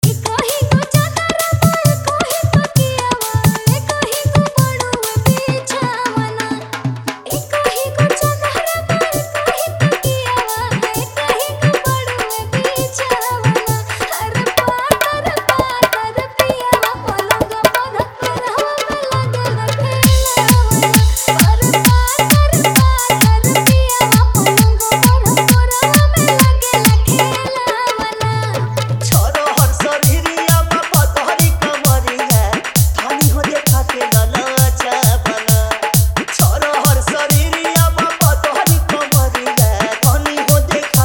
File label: Old Bhojpuri Hard Dhollki Bass Mix